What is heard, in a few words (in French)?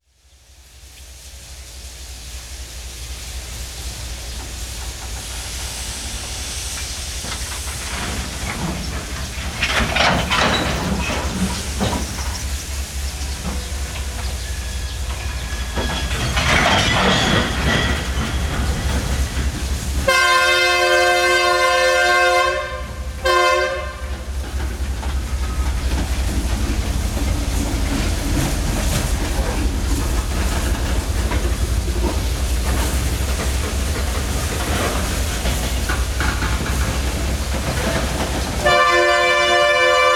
locomotives a vapeur; sonotheque